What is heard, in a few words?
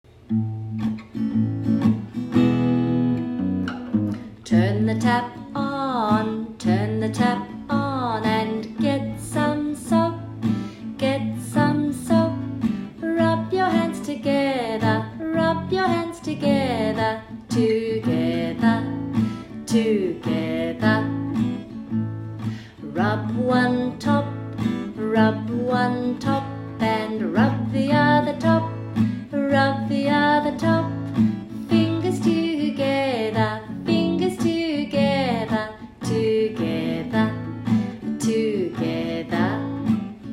washing hands thoroughly song